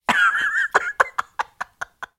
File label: Laugh